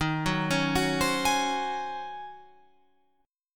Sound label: Eb Minor 13th Eb down Guitar Open F; Ebm13 arpeggiated